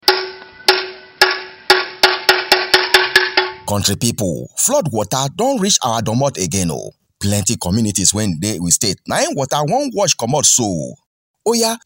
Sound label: FLOOD JINGLE PIGIN